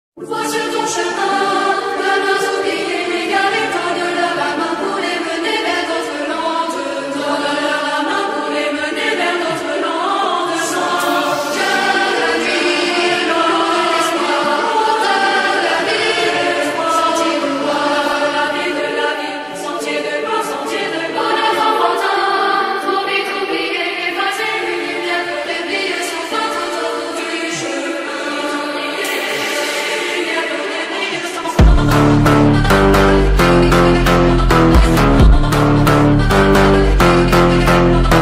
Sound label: phonk